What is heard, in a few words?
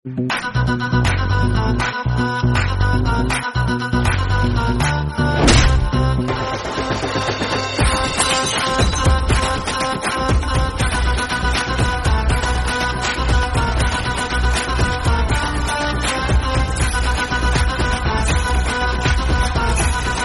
ghost bgm